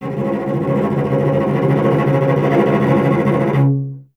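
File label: strings cello C#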